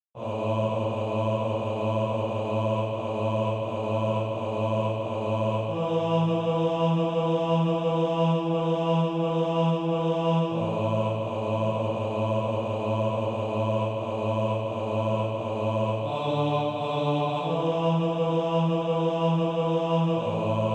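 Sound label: bass, SATB Practise Music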